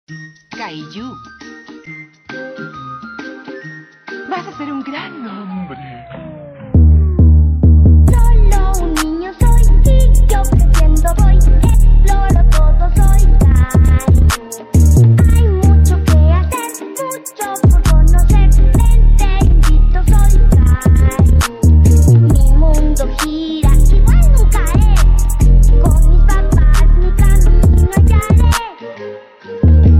trap

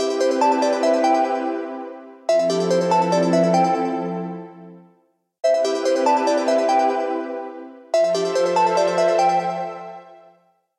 sony xperia kitkat

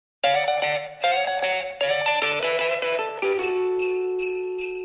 whatsapp jinglebells

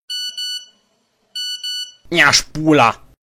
nokia old original

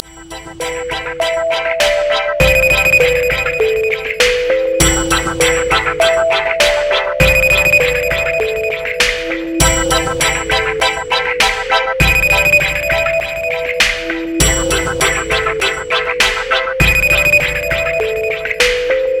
Crystal, un tono suave; tono de llamada

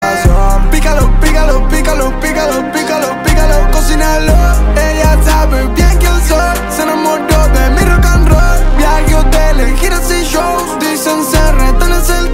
rock and roll

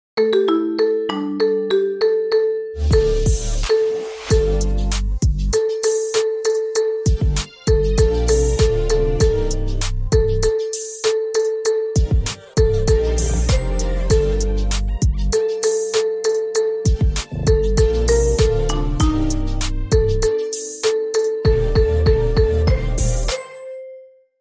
marimba

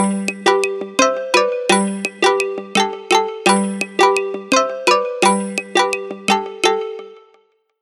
samsung z flip new

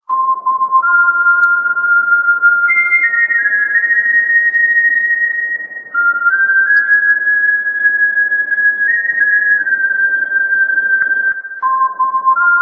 ocarina; tapion

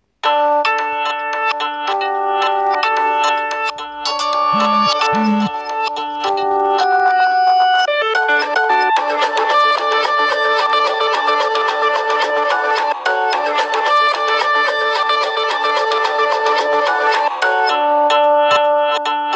moto g4; tono de llamada

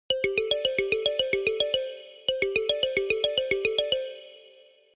samsung galaxy note sms

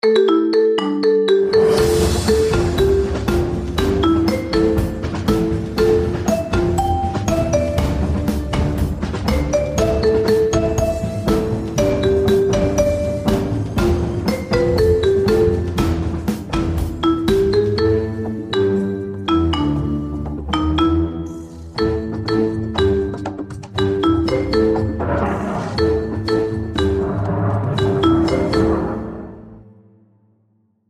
Marimba; tono de llamada

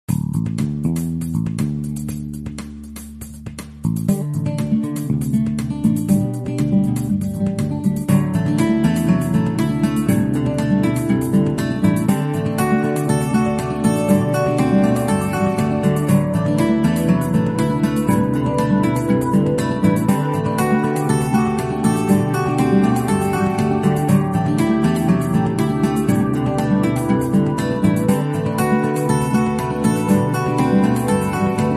iphone 15 soaring

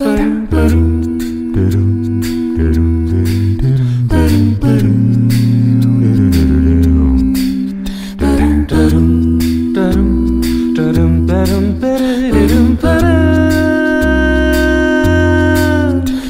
acapella

